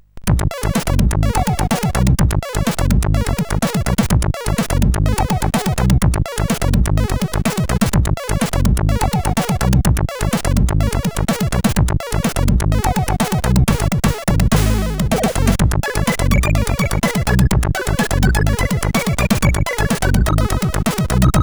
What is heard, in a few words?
Party Music; CSG8580R5